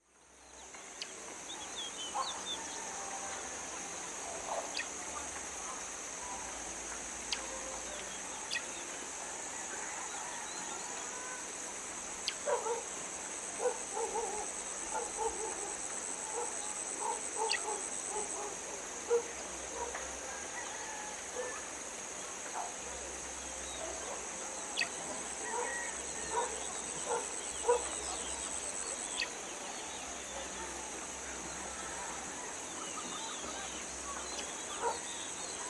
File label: village quiet insects birds dogs and light activity away; fvtt sonniss gdc audio